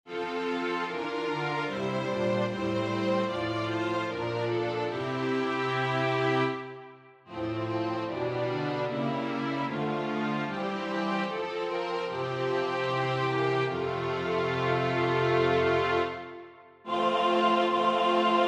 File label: soprano; Salve Maria - patrona Regina Rosarii